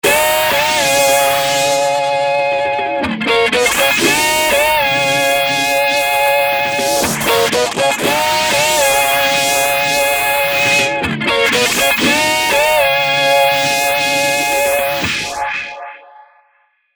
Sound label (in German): E Gitarre Rich Aggression